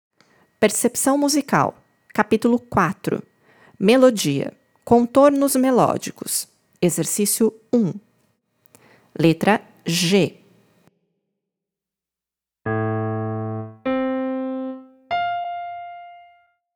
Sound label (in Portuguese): PERCEPCAO Melodia Contornos Melodicos